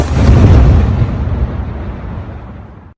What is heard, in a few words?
explosion